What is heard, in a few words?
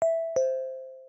pinpon; puyo